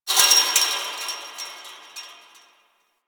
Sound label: Fence Rattle One Off